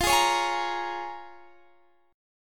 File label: Bb Minor 7th, guitar